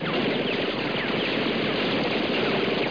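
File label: machine gun; 10,000 Graphics Pack Volume 1; Media Graphics International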